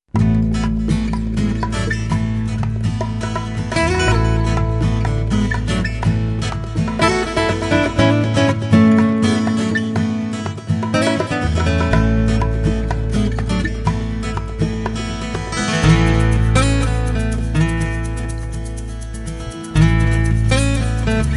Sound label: jingle; Jingle Bells